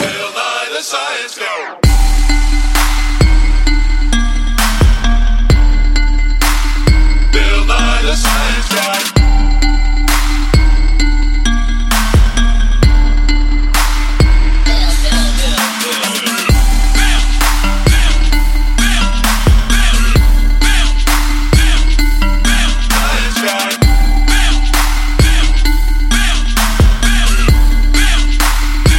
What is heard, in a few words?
Trap; Bill Nye